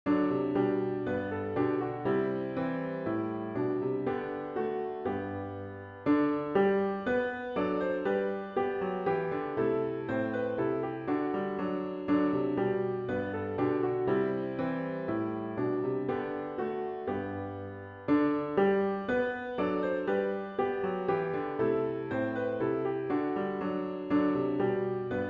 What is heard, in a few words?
Let All Things Now Living piano with intro